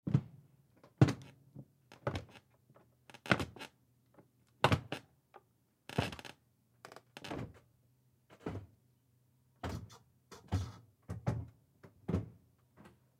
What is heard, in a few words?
Footsteps Walk Up Stairs Slow Underneath Staircase Perspective; Foley